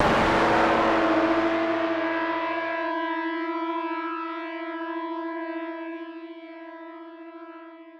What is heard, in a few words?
Dramatic Moment